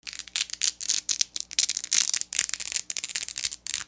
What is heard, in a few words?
RIPPING